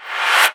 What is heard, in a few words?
Reverse FX